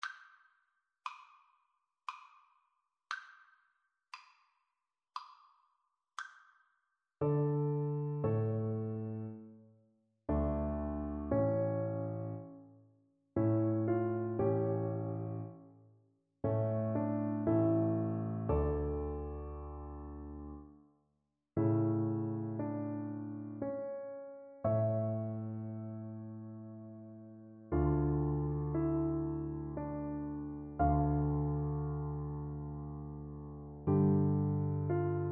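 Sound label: recorder karaoke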